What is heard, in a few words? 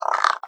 idle croak, crow; critters